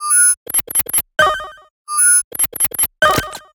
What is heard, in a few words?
Picopico